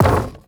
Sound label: wood equip; inventory